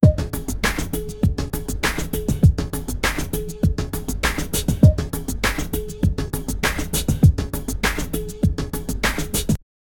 beats; sprunki

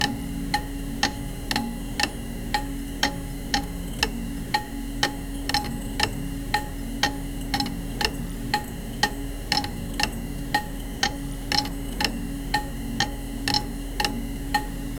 a steampunk style music, clicks

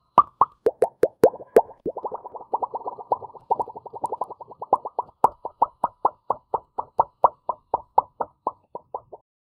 soft bubble popping and f

